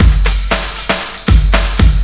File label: Pocket Beat; Urban Shakedown